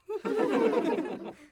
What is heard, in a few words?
Human Crowd Laughing Giggle